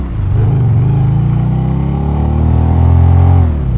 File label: second, vehicles; gmod; tdmcars; gtav; police b